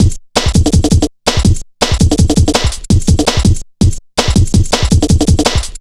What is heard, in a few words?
Drum Bass, Drumloops, 165bpm